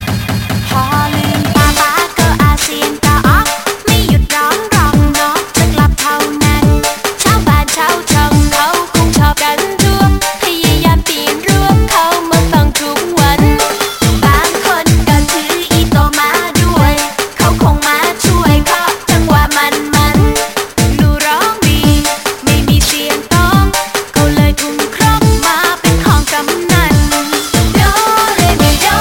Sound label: Techno Thai